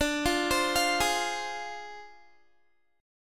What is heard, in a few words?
D Minor 7th Flat 5th Guitar; Dm7b5; arpeggiated